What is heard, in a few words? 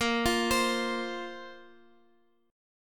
A sharp Suspended 2nd down Guitar Open D